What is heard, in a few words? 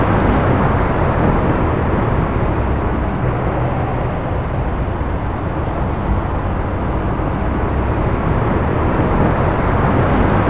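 distant traffic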